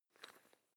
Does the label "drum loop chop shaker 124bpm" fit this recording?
No